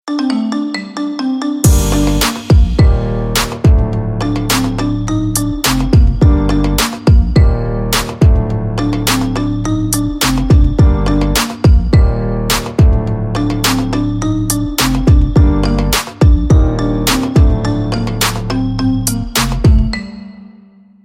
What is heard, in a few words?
Ring iphone